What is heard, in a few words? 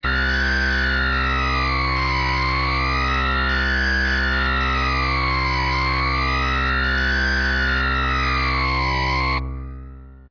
Dist Git; nordlead2